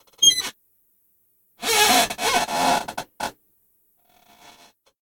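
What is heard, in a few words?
Squeaky Chair; SyrinScape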